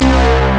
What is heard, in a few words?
B synth; ST-XX Amiga Soundtracker Sample Packs